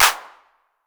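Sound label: 808 clap; PERC TR; Wizoo - Platinum 24 Electronic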